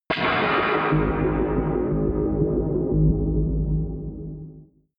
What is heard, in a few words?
synth hit whip fall; whooshes rises